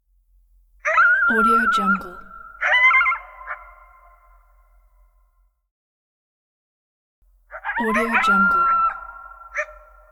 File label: coyote